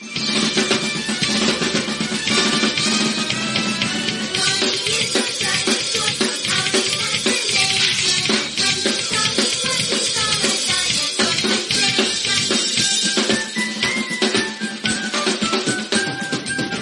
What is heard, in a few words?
Metal Instrumental Ringtone; Metal Barney